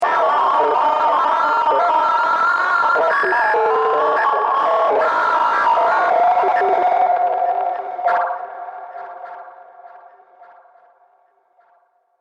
Computer Sound Effects, science fiction sound effect; This Computer Is Broken